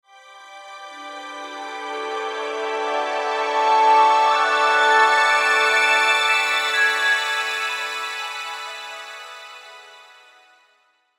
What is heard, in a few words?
Logo Strings Swell Twinkle; Sonic Logo Sound Effects; Production Element Imaging Element Accent Transition